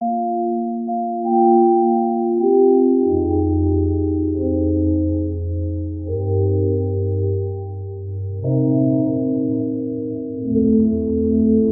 melancholy slow pieceful

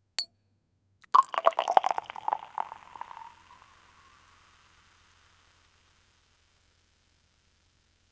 pop a bottle of champagne; drink

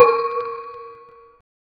short but stronger metall

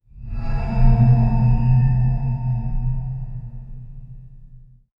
dark energy coming out of, blade

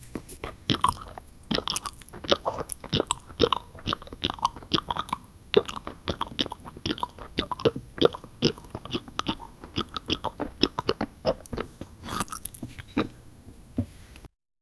middle aged man chewing; eating

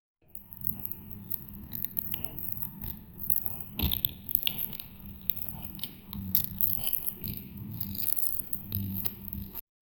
realistic, robotics

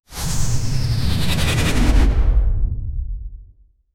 whoosh sound effect, animation

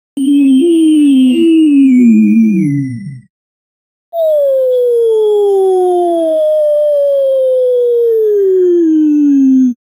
cartoonish low frequency; sound effect

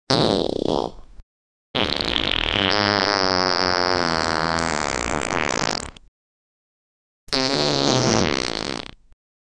male loud fart sound; prank

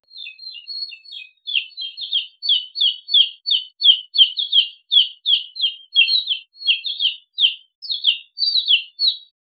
eagle chirping and attack; bird

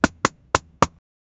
the sound when a bat, baseball

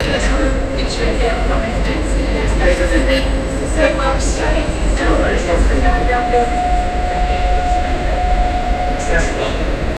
train station ambience; announcements

